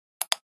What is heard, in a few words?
Button Toy Drum Hit